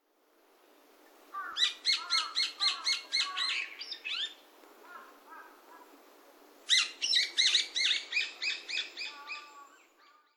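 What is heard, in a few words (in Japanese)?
hiyodori, brown-eared bulbul; Mt.Takao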